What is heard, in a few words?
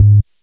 Syn Bass; Equipped Music